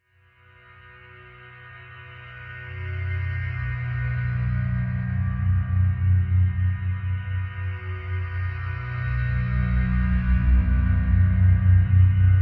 Bed Galactic Feedbac

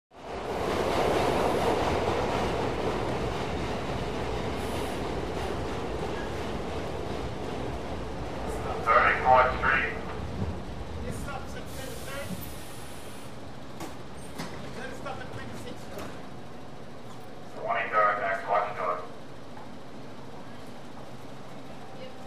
Interior Old Subway, subway

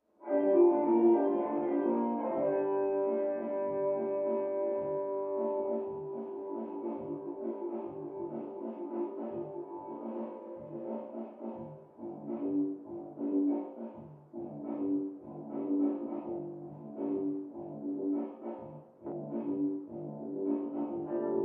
Fusion Funk

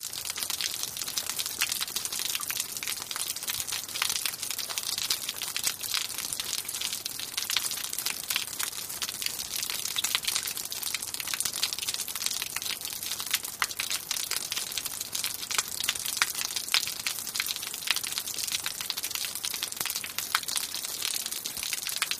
Rain Dribble On Asphalt Close Up

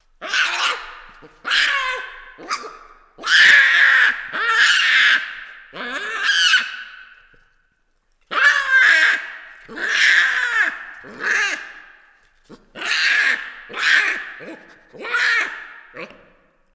Baboon Multi Attack B

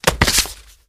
Body Falls On Cement

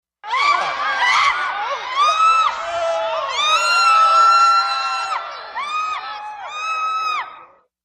Theater crowd, startled screams, frightened

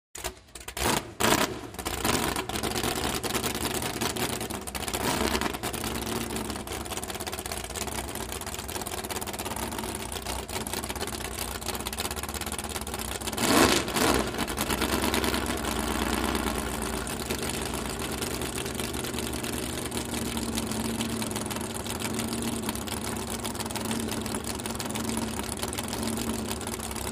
Drag Boat Start idle

